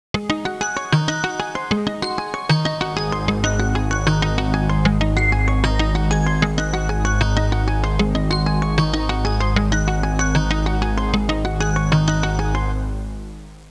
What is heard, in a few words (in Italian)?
musica elettronica